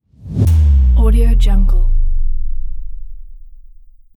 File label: cinematic boom